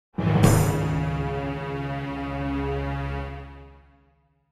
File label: Shock; An NPC's Odyssey